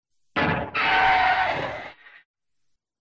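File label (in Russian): взрыв лопнувшей шины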